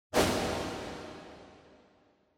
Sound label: Motion Agility Reverb